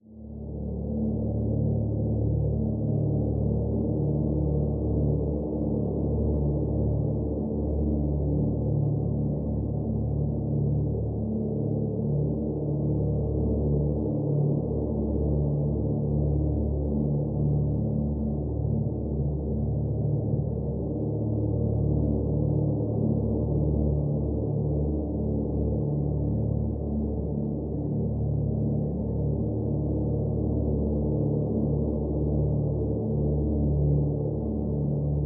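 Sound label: Drone Wind Farm Simple D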